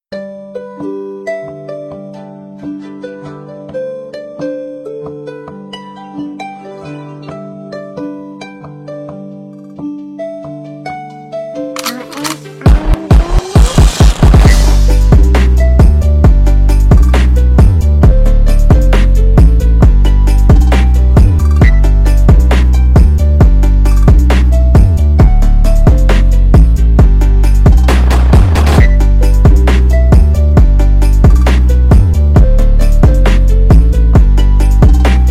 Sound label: Samsung Alarm Phonk Remix; ringtone